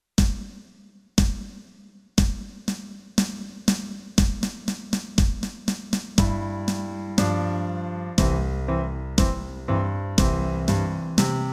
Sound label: Duke in Bb; brass; backing tracks